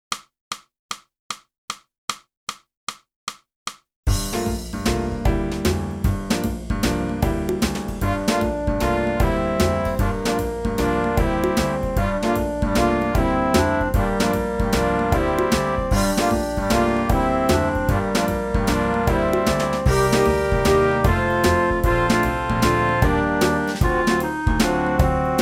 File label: sax